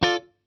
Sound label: D Minor Skank